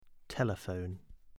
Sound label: telephone